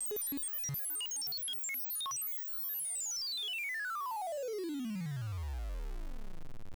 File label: twinkle; pxt-calliope